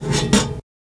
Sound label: random kitchen